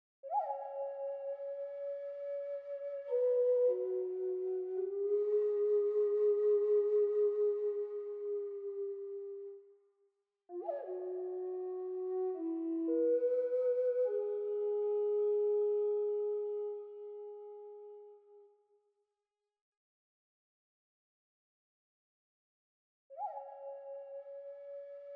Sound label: music flute; aztec; aztec2